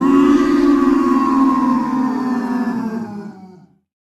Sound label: Crowd Boo; Pitch Black Audio Pong